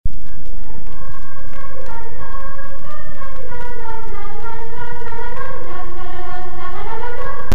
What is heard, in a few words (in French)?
chorale, marche; st martin